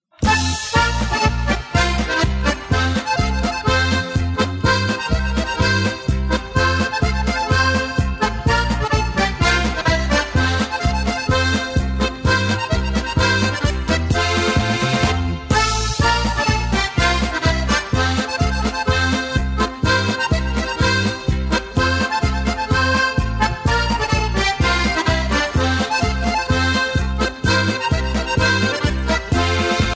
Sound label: Polka